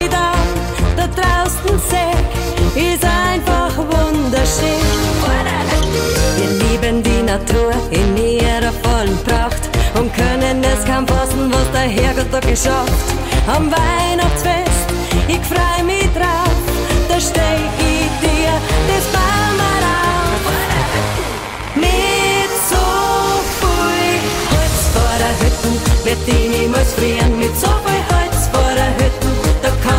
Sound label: Oktoberfest Hit